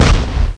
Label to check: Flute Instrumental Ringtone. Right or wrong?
Wrong